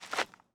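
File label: dirt to jump; firefight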